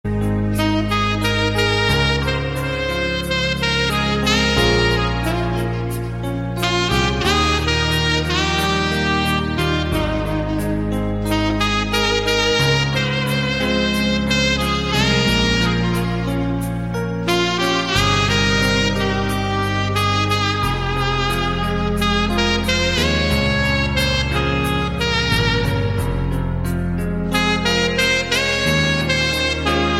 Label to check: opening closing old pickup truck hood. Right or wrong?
Wrong